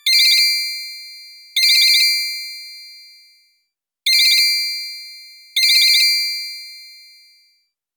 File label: metallic, ringtones; standard ringtones